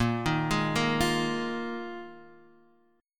Bb 7th Suspended 4th Bb down Guitar Standard; arpeggiated

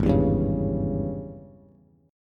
Ab Major 7th Suspended 4th Sharp 5th Ab down Bass; strummed; JGuitar

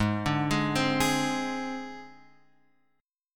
G sharp Major Flat 5th, chord; G#Mb5 Chord {4 5 6 5 X 4} arpeggiated

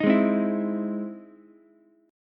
E Augmented Major 7th E down Ukulele; strummed; E+M7; JGuitar

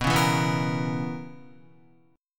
B Major 7th Suspended 2nd Suspended 4th B Guitar; strummed; 4F,4A,5C,5G,5C,6E; 6,4,4,4,4,6; JGuitar

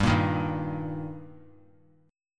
G Minor Sharp 5th G, chord; strummed; JGuitar

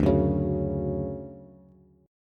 D-Major 7th D down Bass; strummed; JGuitar

